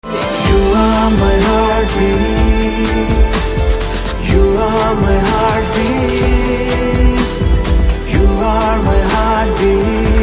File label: Love Telugu Ringtone